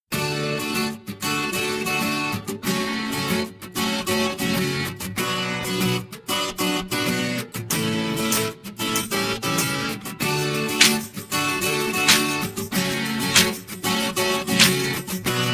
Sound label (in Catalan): Ràpida sense flabiol